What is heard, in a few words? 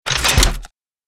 Rocket launcher loading; artillery